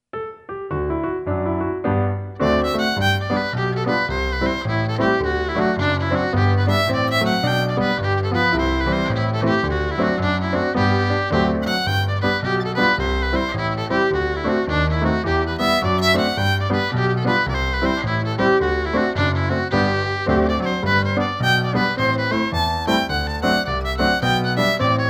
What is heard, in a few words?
Catfish Jig